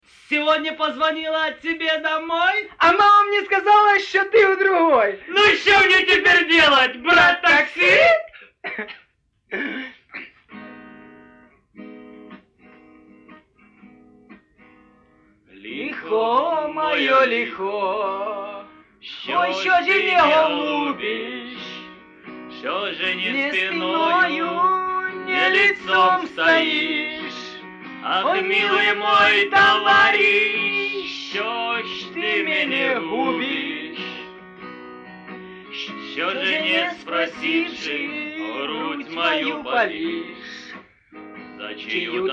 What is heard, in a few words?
unplugged